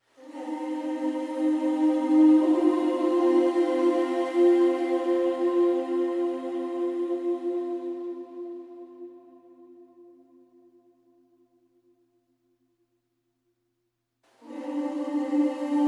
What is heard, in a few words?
choir only; nidaros